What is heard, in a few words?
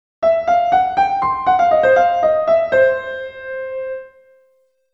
Jazz